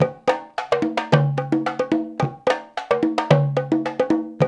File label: 108 NONG, Nongo; Spectrasonics; Supreme Beats; African Contemporary